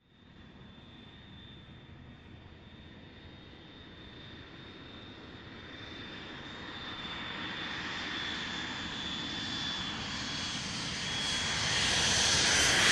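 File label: Large Jet Pass Low Land, jets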